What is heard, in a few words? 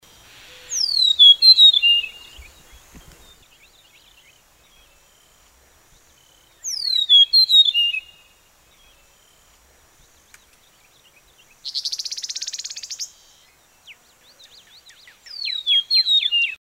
eastern meadowlark song; mike nelson